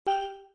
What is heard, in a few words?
Passenger Score; Subway Scramble; Sfx Game Passenger Score